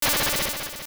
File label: Fire Rod; Fire Rod blasting (also Winged Demon shooting fireballs)